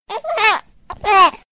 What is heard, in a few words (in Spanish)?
bostezo de bebe